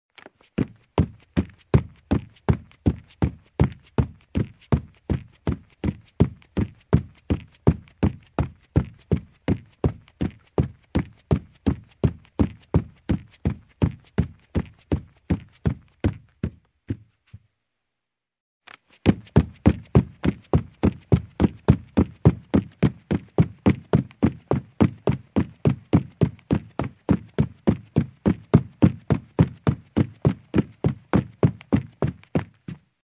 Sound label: Running in shoes on a carpeted wooden floor; steps